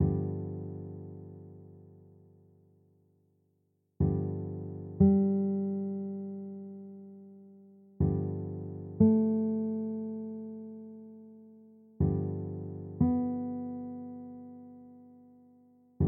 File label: g mixolydian vamp